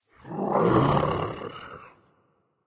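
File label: Movement Growl; smite; god bakasura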